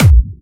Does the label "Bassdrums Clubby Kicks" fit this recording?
Yes